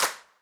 clear clap sample f key; single hits one shots

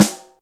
studio snare sound g sharp key; snare drum one shots

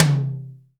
short reverb tom drum sound c key

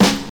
dark sounding hip hop acoustic snare c sharp key

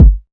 tight lows kick sample g key

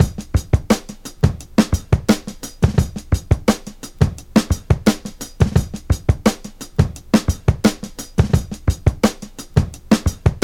115 bpm drum beat e key

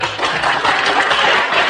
melodic applause

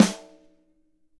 boom acoustic snare sound g sharp key; snare drum

